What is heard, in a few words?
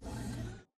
atlas servo sprint; Titanfall 2